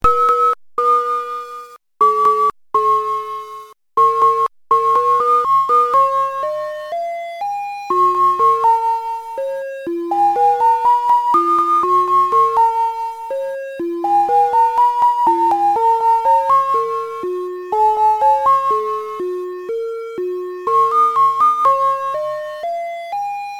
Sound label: onsen sine tri